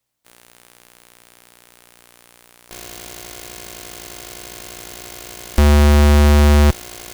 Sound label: noise; chipmusic